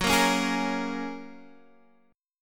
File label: F sharp Major Add 9th, Guitar Drop D; chordshape; down